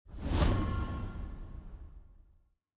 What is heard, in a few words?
ui secret area open low layer